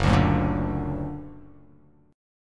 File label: F sharp Augmented F sharp; F#+ strummed; 2,5,4,3,2,x,x,x